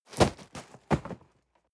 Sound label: cardboard box medium fall high; objekte